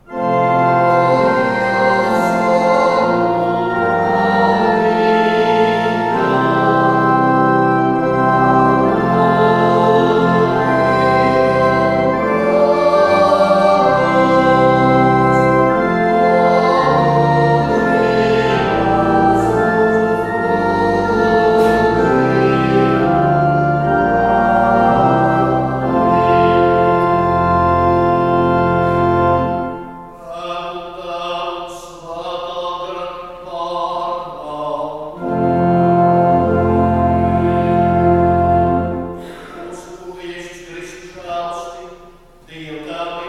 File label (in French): Messe a Rucava